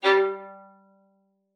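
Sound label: violin spiccato G5; Xsample VOL-5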